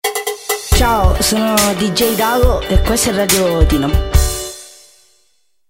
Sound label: calm guitar